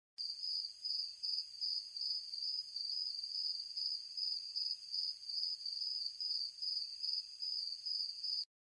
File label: crickets ambience, nature sounds